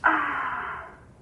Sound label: texttone; irene adler; sweet sherlock